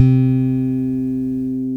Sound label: C-3 I-BS, bass; AKAI S-Series CD-ROM Sound Library VOL-8